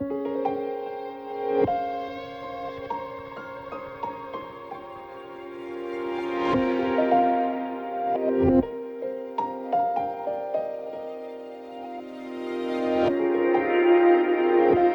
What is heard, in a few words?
loop; RainDrop